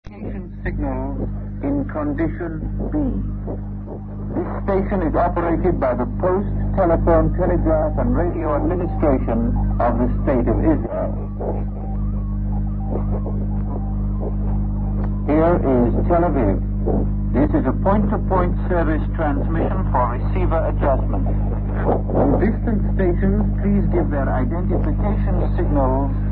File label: ptt tel aviv usb